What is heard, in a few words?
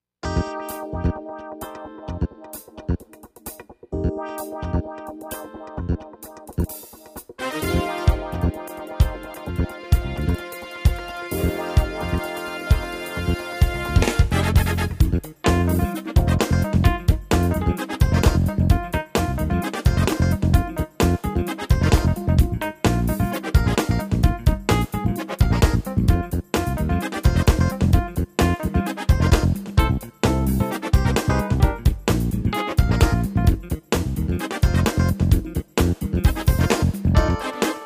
Tyros Style Kool Funk